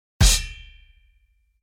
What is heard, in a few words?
cymbal choke